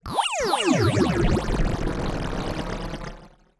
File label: Virus LFO Sweep